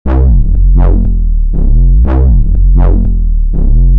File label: modulation loops